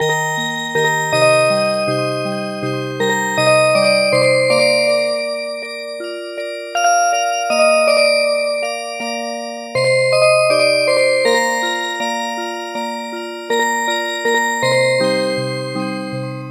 Music Box

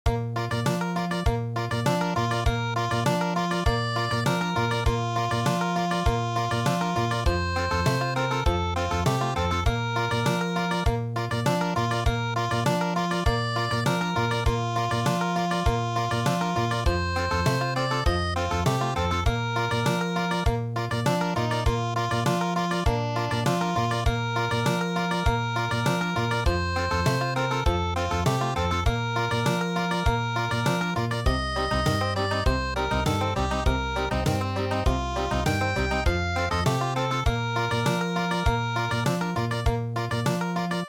Country; midi; traditional

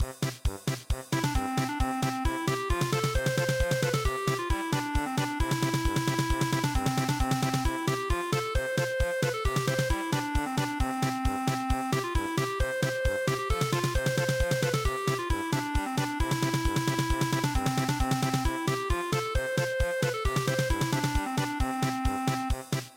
polka

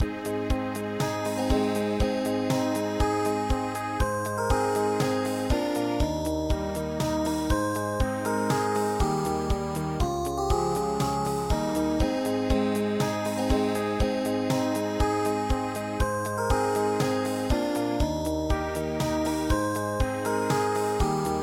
ballade

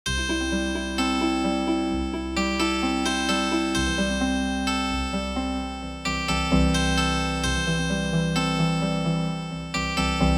Healin Harp

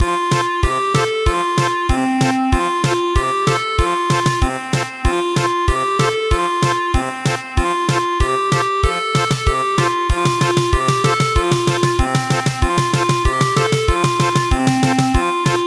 German Polka

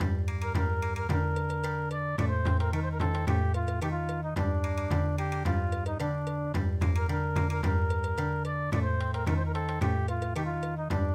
Bon Odori, midi

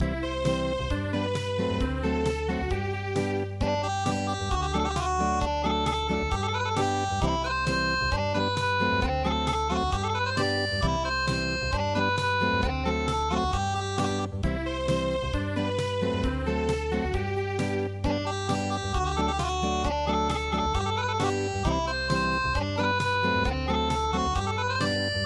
Acoustic, midi traditional

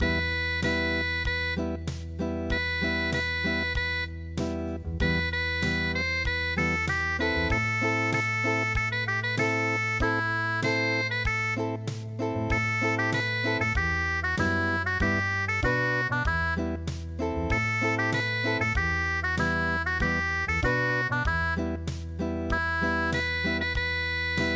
unpluged, midi

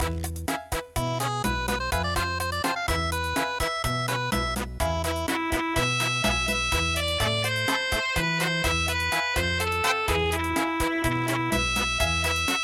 Cha Cha Cha

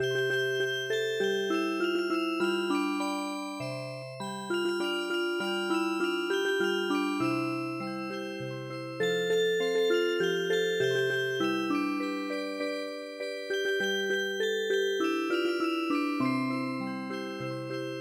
Music Box

